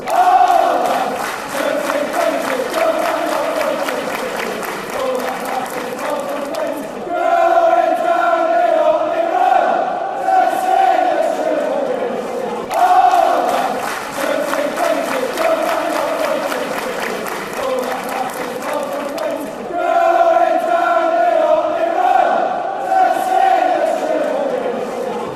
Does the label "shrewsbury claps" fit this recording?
Yes